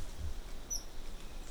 Call A Chirp, titi monkeys; vocalisations; compcog

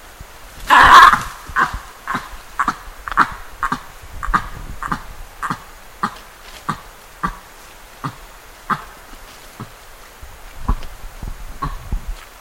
baboons; vocalisations

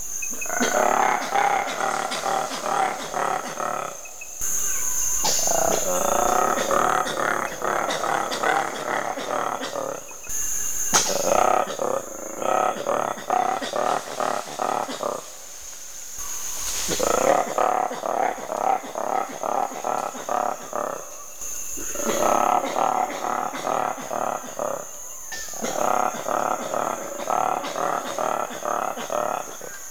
Polykomos Eagle, vocalisations; compcog

